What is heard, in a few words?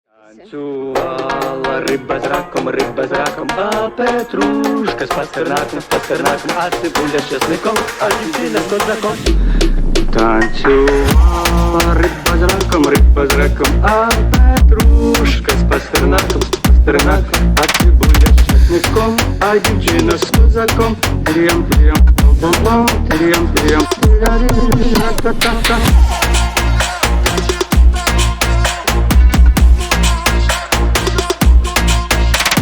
BRAZILIAN PHONK